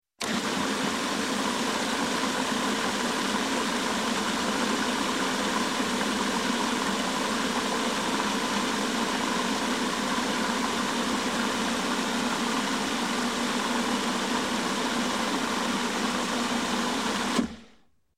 Food Processor Start, Run, Stop, Liquid And Dry; Sound Ideas 2000 Series

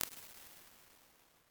19x24 ft Studio Instrument, impulse responses

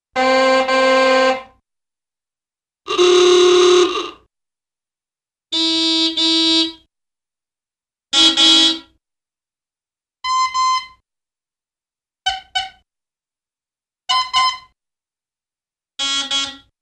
Various Old Car Horns, Cartoon; Hollywood Edge; Cartoon Trax

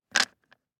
DICE on Plastic, Drop, Standard, 6 Six Dice; Samples; ArticulatedSounds; DICE Sfx Party (Extended)

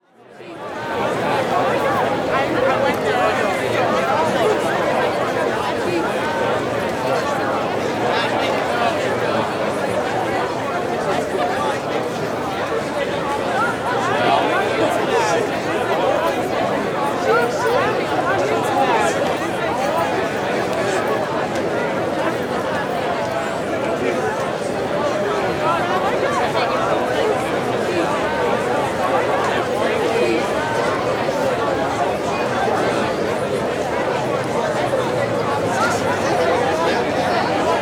Crowd Outdoor Busy Gathering Courtyard, Walla; Sound Ideas; Walla Series

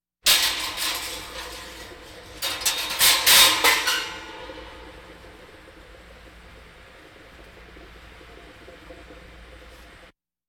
Hospital effects; Valentino Production Sound Effects Library CD50

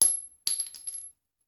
Bullet Shell; Sound Ideas; Guns

